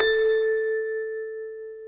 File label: Bell A4